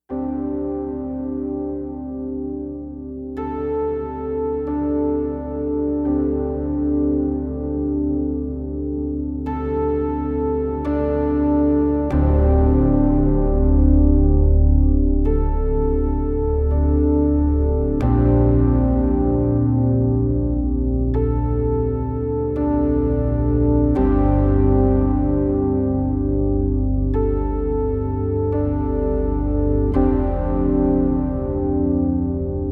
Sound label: Breath